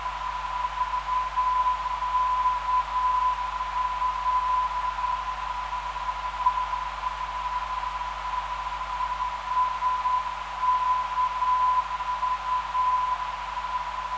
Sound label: 432kHz, ndb